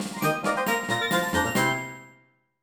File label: Fanfare Level Up; Vandal Hearts II; PS1